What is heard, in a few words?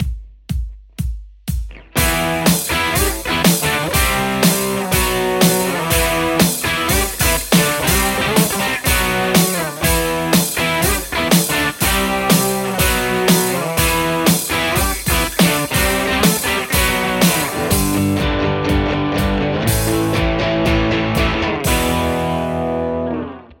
tele JCM800; mercuriall